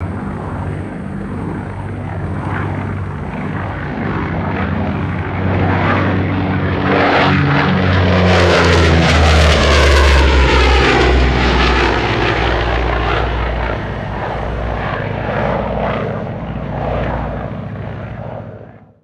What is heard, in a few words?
vintage propeller bomber low flyby; aircraft